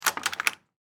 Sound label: Padlock Shake, Foley, Padlock, Shake, Movement; Objects; BlastWave